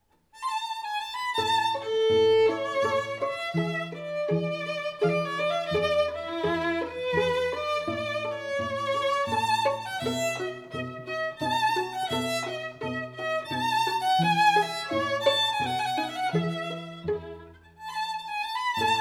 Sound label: Boccherini Minuet, String Trio